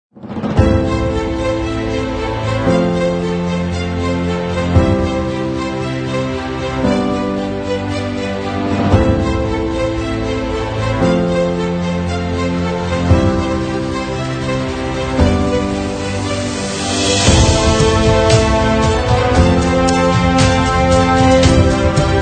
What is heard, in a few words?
Epic Victory Full